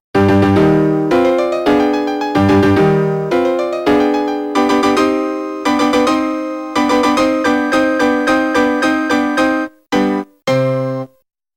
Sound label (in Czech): melodie